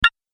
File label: Interface Sound, electrical button